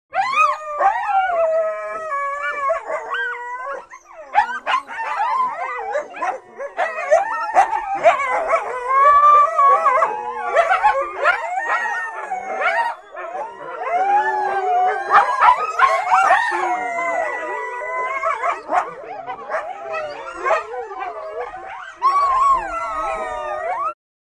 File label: Coyote Howls, Barks. Group Howls, Yelps And Barks. Medium Perspective.